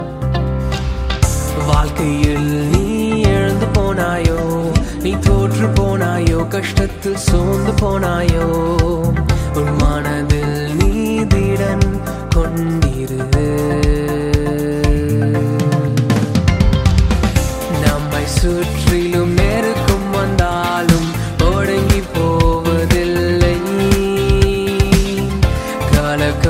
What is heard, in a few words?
tamil christian ringtone, christian gospel